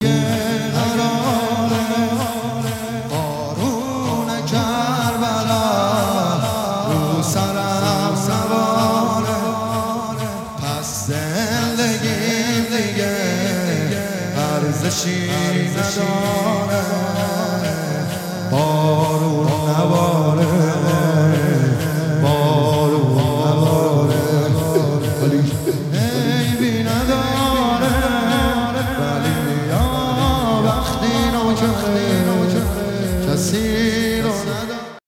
مداحی

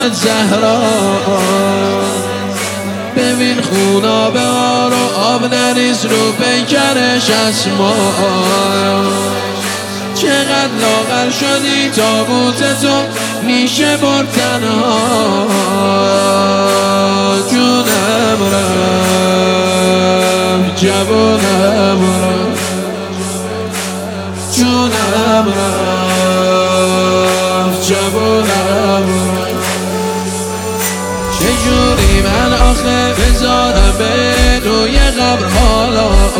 مداحی

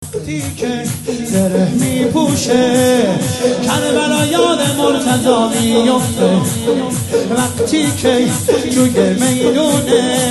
مداحی شور

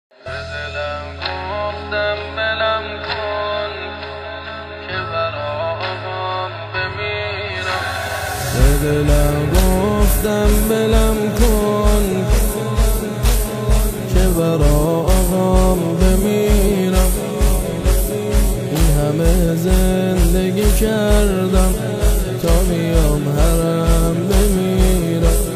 مداحی

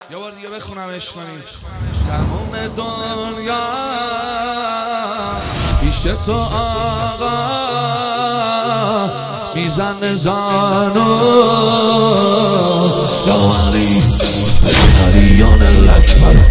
مداحی

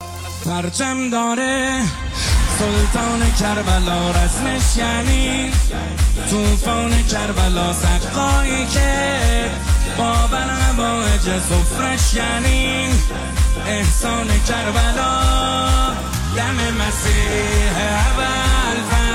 مداحی استودیویی

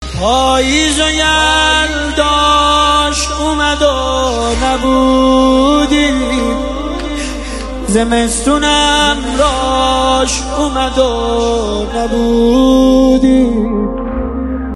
نوحه

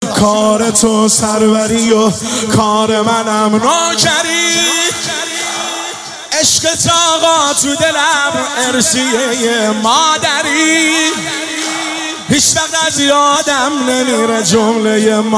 مولودی

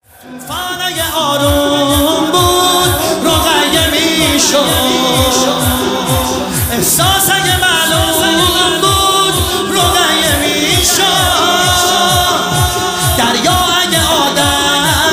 مداحی; شور

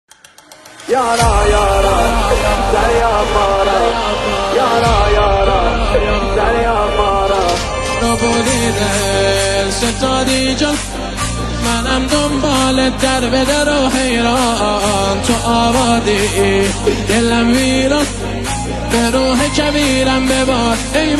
مداحی

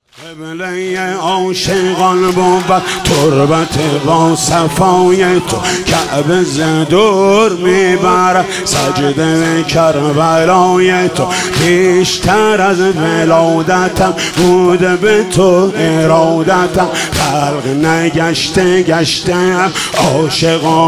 نماهنگ

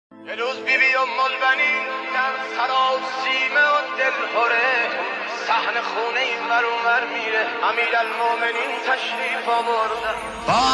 روضه; روضه بقیع و حضرت عباس ع

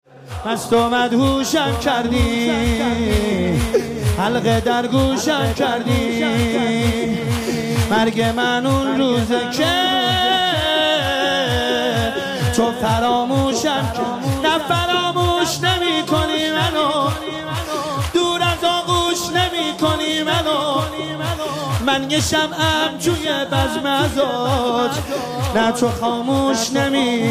مداحی; شور

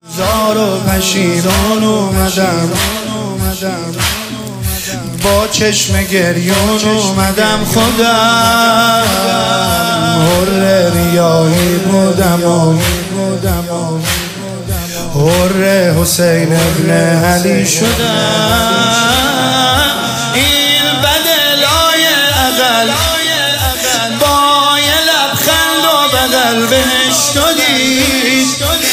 مداحی

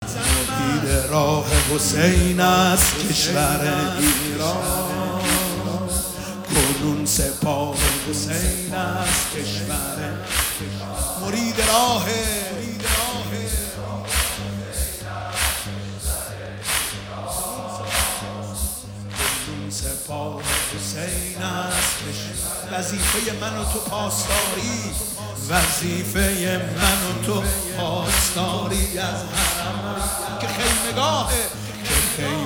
مداحی

